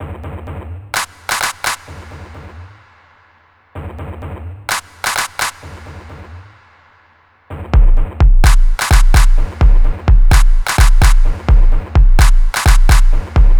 untitled drum thing with handclaps and compression; weeklybeats-2014